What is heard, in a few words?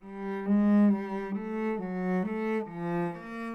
strings, Sad